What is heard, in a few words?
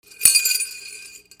MONEY